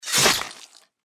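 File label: deathclaw claw attack; npc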